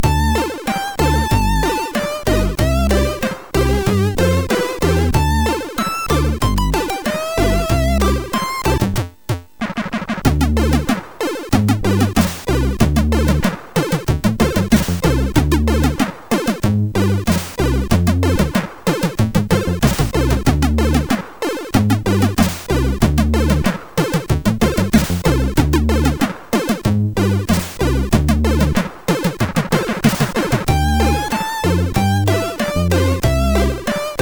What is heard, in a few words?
Bass, MOS6581R4